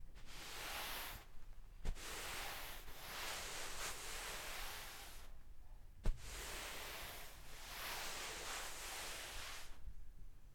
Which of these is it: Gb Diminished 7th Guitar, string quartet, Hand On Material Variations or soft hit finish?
Hand On Material Variations